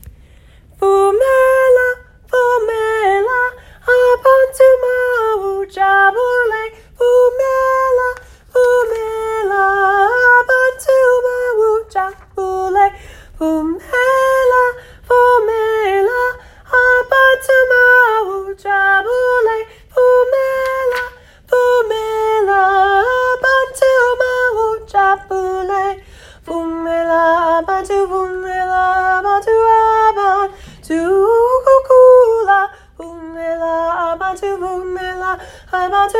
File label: soprano